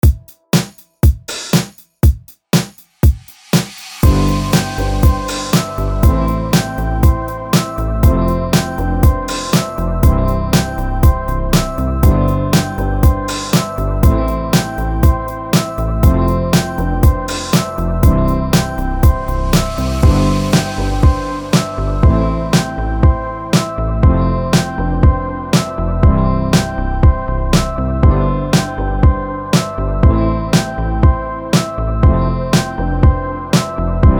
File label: bedroom pop drum kit